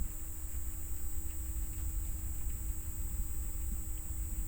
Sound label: Hydrophone